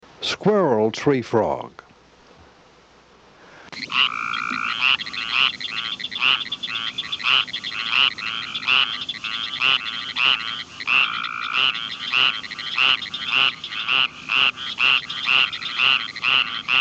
Squirrel treefrog (Hyla squirella); Family Hylidae - Treefrogs

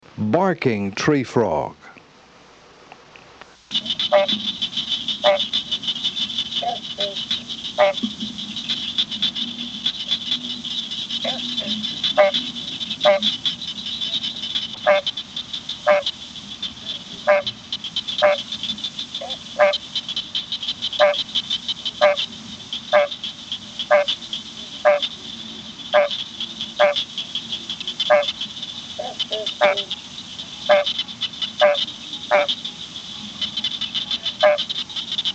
Barking treefrog (Hyla gratiosa); Family Hylidae - Treefrogs

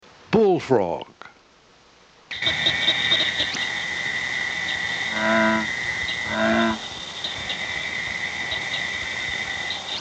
Bullfrog (Rana catesbeiana); Family Ranidae - True Frogs

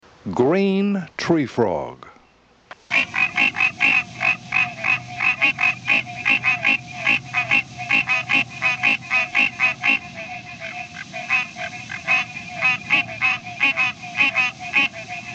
Green treefrog (Hyla cinerea); Family Hylidae - Treefrogs

